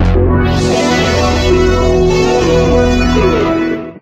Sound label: Victory Theme; Tenth Degree; Arcade; Victory Theme 3